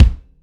rich mid-range kickdrum C key, bass drum one shot tuned to the C note